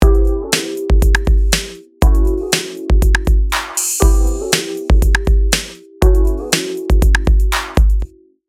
Key Drum Wet, Here are the wet keys and drums combined.; 3d mixing